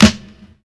solid snare drum sample D key, snare drum sound tuned to the D note; snare drum samples